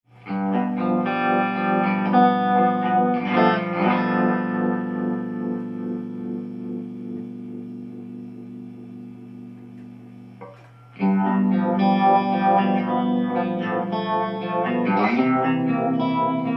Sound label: phaser, guitar